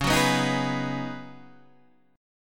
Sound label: Db 7th Suspended 2nd Suspended 4th Db down Guitar Open D